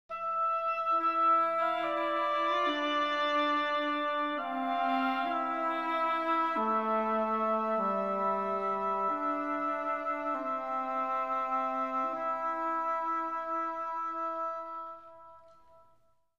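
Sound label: melody; Myst III Exile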